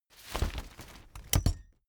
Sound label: umbrella big open full